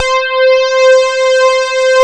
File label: FILTER SWEPT, PROPHET, OLD SYNTHS; Rubber Chicken Software Sample Bank; SCI PROPHET